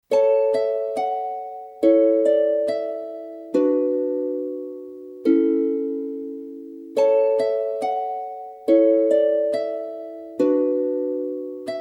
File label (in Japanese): 水のオルゴール; Final Fantasy- Crystal Chronicles